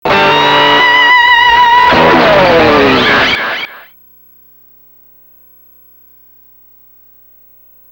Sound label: Start Jingle; Zoids vs II Battle Legends